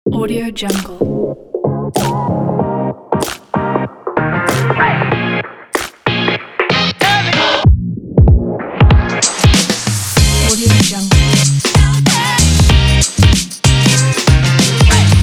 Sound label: hiphop summer